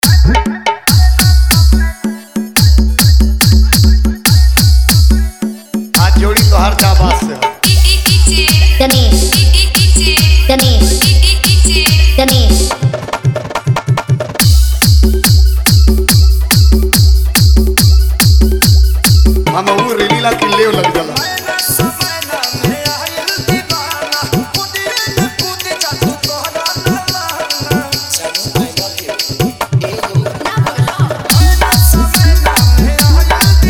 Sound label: Hard Vibration Mix New Bhojpuri Dj Remix Song